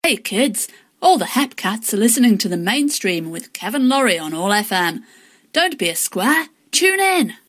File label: stab; greythorne